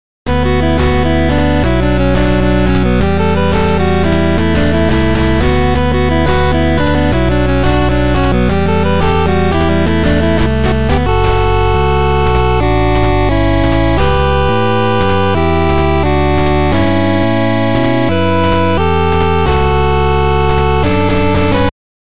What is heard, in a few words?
chiptune